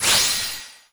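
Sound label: Battle Item Appear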